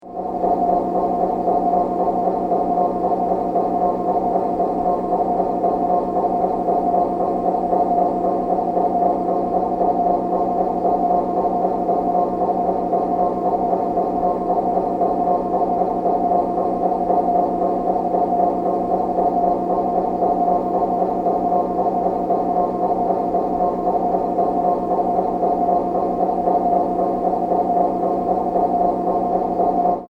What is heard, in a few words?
Overhead Fan Turning Horror Surreal Industrial, Spinning, Mechanical